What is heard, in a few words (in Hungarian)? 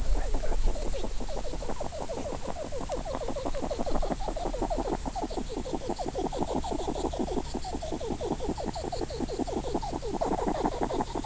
pampog hamarosan elindul, európai sün; termeszetben